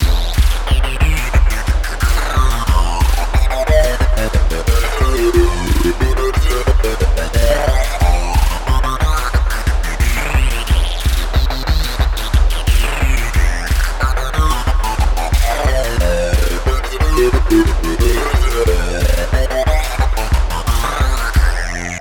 Jolly Game Groove

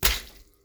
Splat

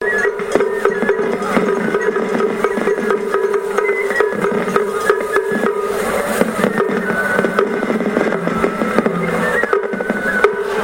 Japanese Obon Festival Music

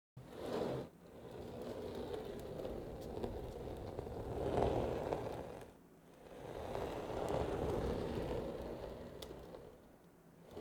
Luggage Bag

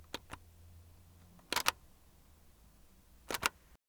Button Press

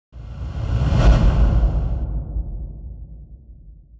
Impact

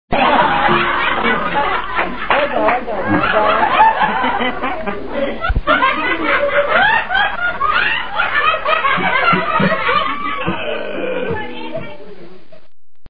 Family Laughing

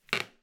Creak Floorboard

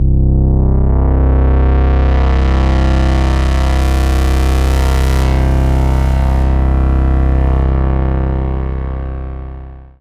Badass Minimoog Bass C4

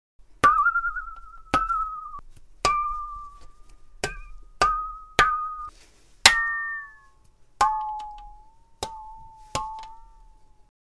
Saw Ping

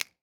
Snap

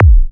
Sine beats

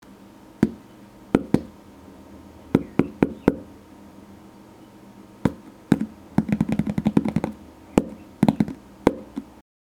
Knocking On Plastic Lid

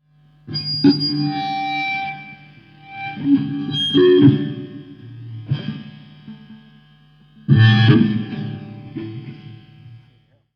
Interesting Three Prong Feedback

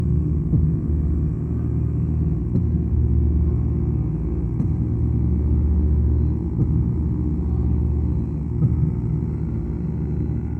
Tension Vocals

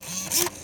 Electronic Computer Cd Drive Motor

